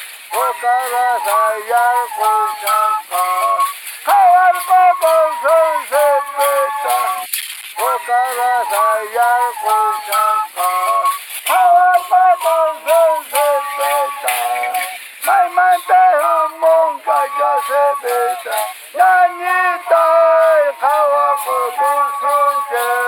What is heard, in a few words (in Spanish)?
canto waynu pujllay; festival luzmila patino